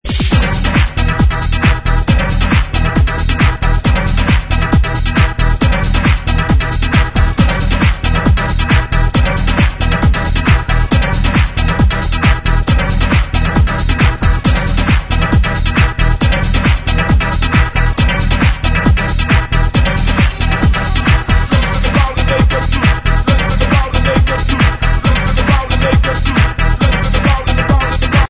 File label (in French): orgue